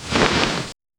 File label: sharp intake of dry breat